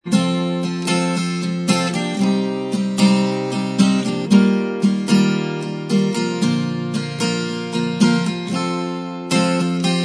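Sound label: acoustic style instrumental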